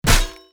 hammer hits shield

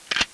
door lock

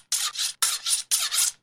blade sharpening

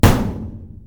metal door bang

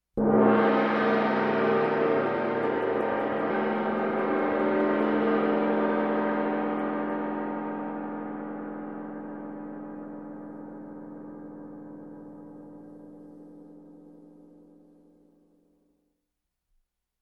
gong hit

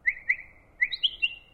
bird trill

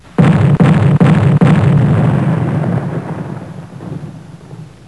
BIG GUN

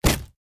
bullet hit wood

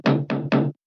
door knock

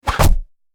arrow hit

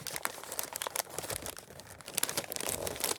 paper rustling

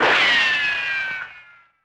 cartoon shot

